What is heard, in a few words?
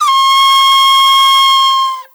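PENNY WHISTLE; Spectrasonics; Heart of Africa 2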